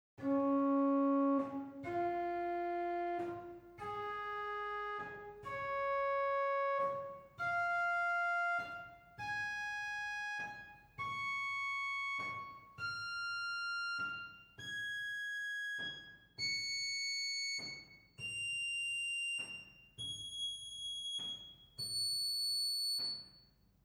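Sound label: Octava 2 arpeggio, organ; Reinhardtsgrimma; typical Silbermann middle-sized church organ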